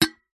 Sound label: foley soda can tin stack empty stack on another